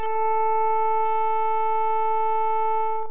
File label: trumpet, synthesized